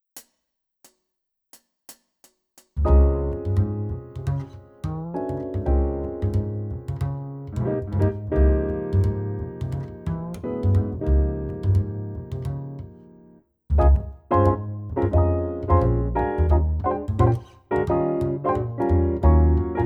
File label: the girl minus drums